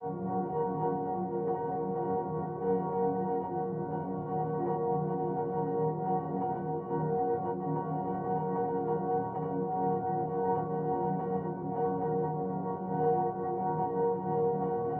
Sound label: granular, pad